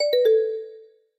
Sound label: Viber Sms Tone